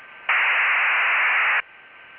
MIL-STD 188-141B App. C BW1 HDL ACK PDU; 3G HF PROTOCOL SUITE; MIL-STD 188-141B appendix C

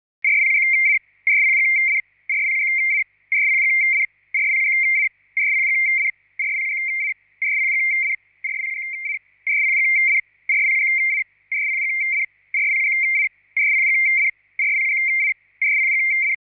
GLOBE WIRELESS 100Bd FSK SELCALL SYSTEM

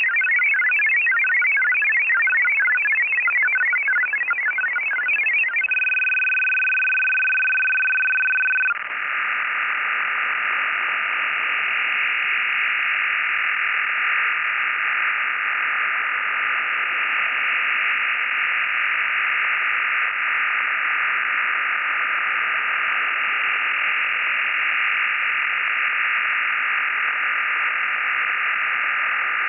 SERDOLIK OFDM 25

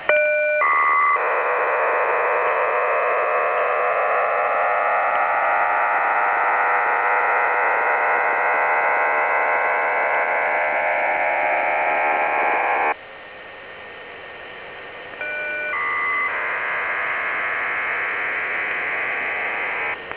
YUG MIL 20-tone

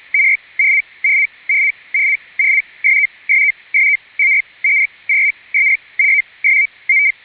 SITOR A ATU80; SITOR-A in ISS mode sending ATU-80 tfc.

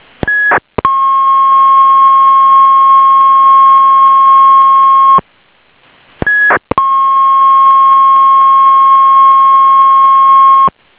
Have Quick TOD, HAVE QUICK TOD SIGNAL; NATO; VHF/UHF only!; HARRIS RF-3560C/RF-3575; 150 Bd FSK FEC

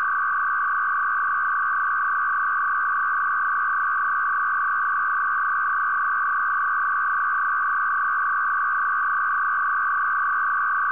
ARQ-E3 idle; Continuous bitstream systems

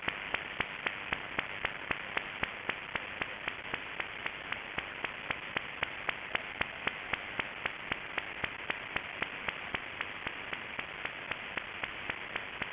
WERA

